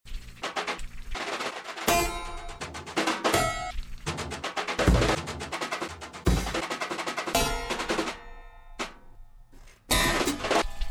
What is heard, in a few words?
drums n harpsichord